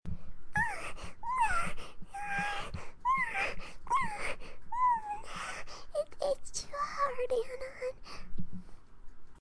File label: moaning; Fluttershy